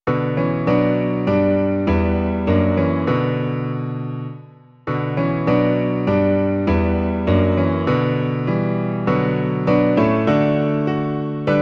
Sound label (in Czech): klavír; Klavírní verze